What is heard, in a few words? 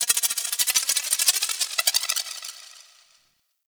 Sculpture Spin; Ambience; Mountain Pass; Oro Sculpture; Warframe; The War Within